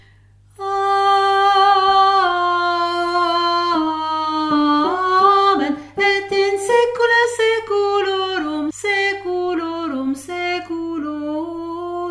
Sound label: et in saecula voix